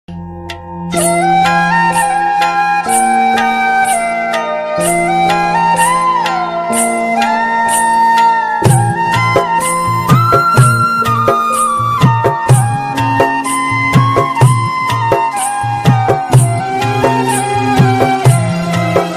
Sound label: bgm ringtone emotional ringtone heart touching ringtone; khatta mitha; akshay kumar